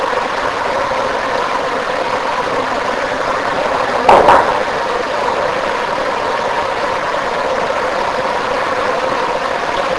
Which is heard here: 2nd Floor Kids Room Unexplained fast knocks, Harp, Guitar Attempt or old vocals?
2nd Floor Kids Room Unexplained fast knocks